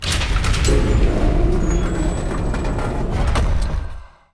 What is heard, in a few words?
Cell Door